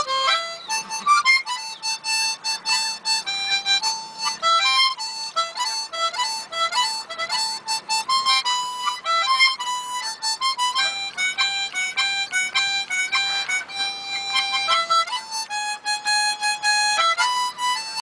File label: Harmonica